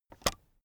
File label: keyboard external space release, computer; kommunikation